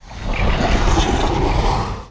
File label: hell rumbles; ambient, hell; Garry's Mod; tf2